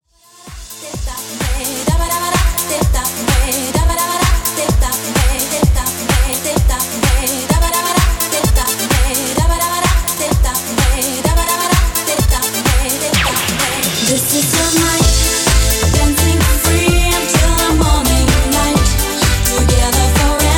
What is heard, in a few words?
Radio Redrum 128 Bpm